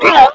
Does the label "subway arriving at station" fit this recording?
No